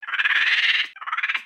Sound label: Pets Skunk Idle Bored; creatures pets; Lego